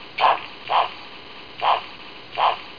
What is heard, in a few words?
heron